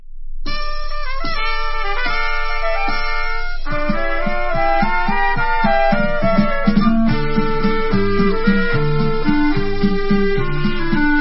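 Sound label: instrumental, Israeli Dances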